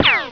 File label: pain; openffa; dalek